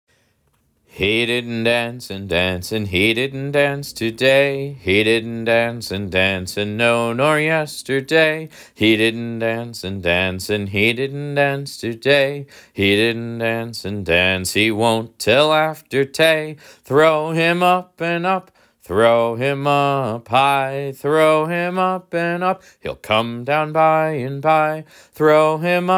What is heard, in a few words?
cucanandy singing